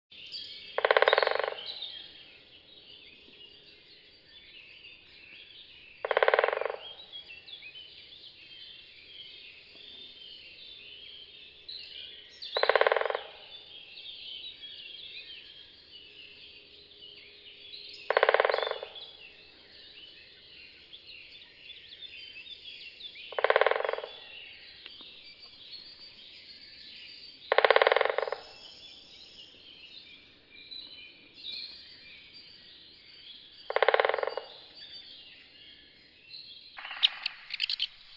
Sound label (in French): pic epeiche cri; oiseaux; pics; parcours santé